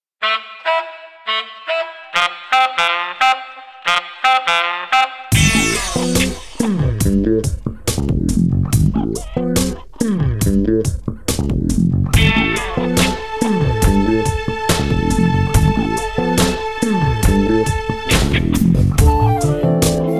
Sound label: Slow Saxflute Guessing Groove; JoosTVD; For Your Pleasure Vol1